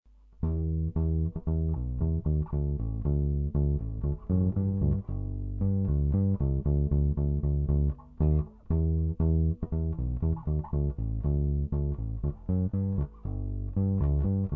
Gibson EB4L, bass

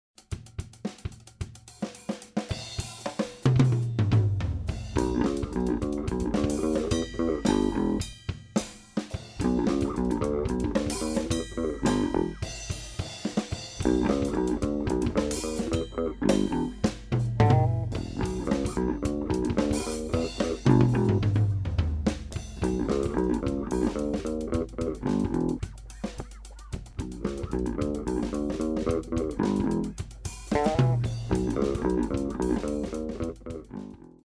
Ripper, bass; 1976 gibson

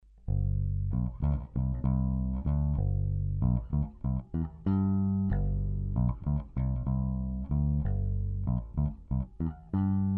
1992 Thunderbird, gibson bass

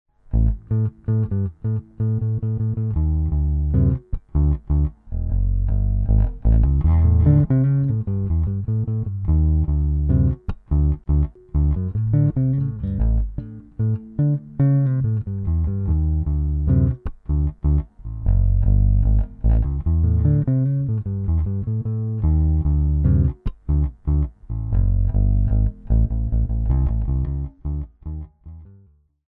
1971 SB-400, gibson bass

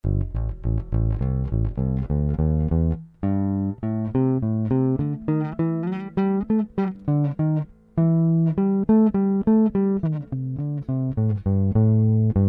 1971 SB-400 neck, gibson bass

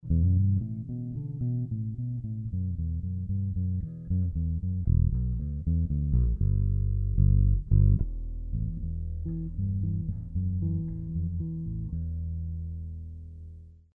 maple 76 g3 neck pup, bass

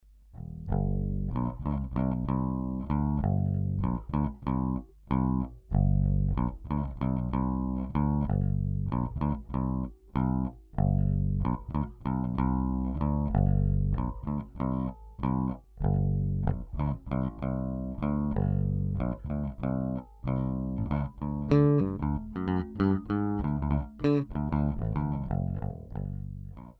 1992 Thunderbird, gibson bass

